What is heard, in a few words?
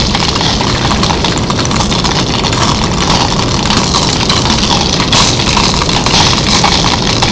Pawn Burn